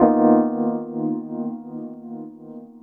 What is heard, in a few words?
pan; 90bpm; Demo Multitracks